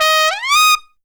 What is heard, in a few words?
T D# SSU, TRUMPET FX; Zero-G; Phantom Horns